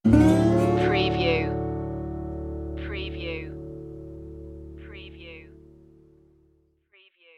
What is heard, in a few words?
starry western night stinger